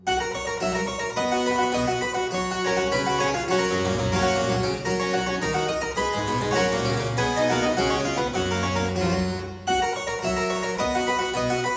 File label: Aria in G major Partita 23, Harpsichord